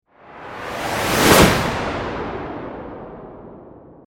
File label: Warp, Dimensional, Science Fiction; Scifi; 5.1